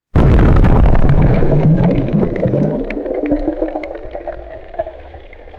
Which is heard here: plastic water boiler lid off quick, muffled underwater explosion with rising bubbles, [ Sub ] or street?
muffled underwater explosion with rising bubbles